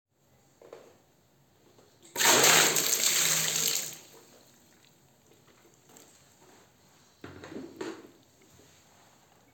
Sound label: Bucket Of Water Spilled